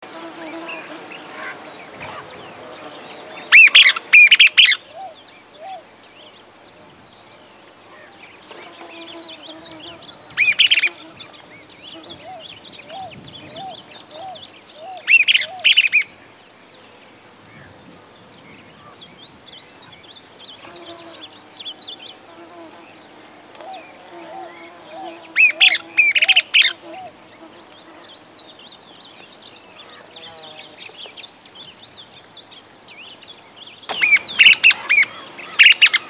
canto do papa moscas do sertao

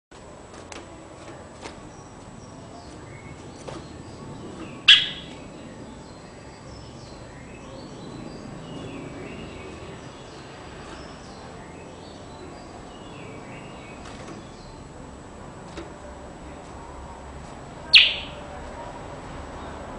canto do bicudo

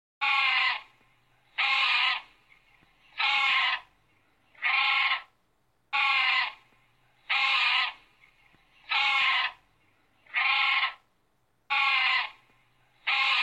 Canto do mergulhao de pescoco ruivo

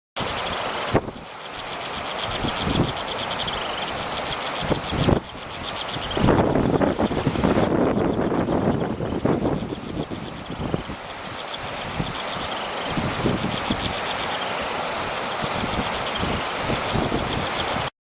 Canto do mineiro de bico fino

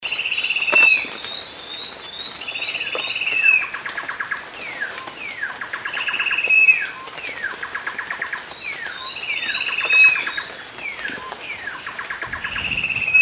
Canto da choca cantadora

